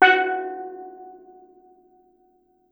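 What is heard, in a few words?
STEEL DRUM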